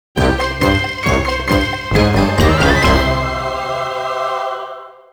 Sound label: (Jingle) Minigame Won